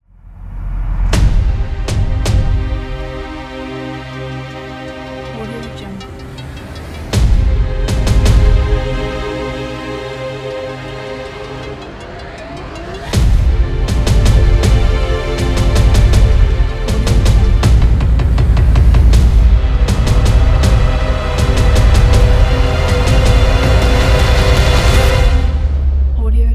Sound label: movie trailer intro